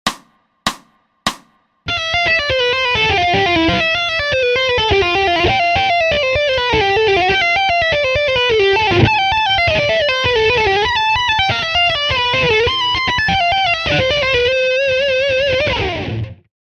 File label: Pick Taps slow